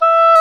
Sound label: Oboe, Orchestral Winds